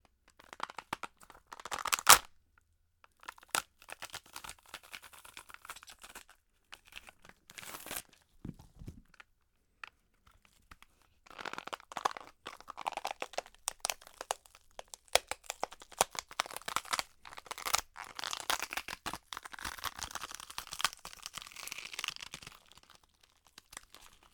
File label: Celery Bunch Of Stick Breaks And Twists